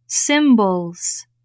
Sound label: cymbals